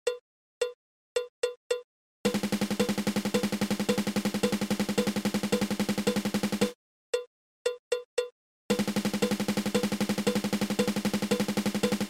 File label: Triple Stroke Roll